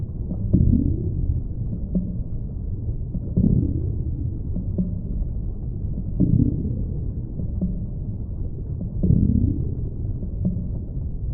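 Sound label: tiyanki idle loop; Stellaris; reanimated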